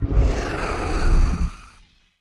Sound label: Creature Growl